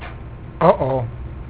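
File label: INCORRECT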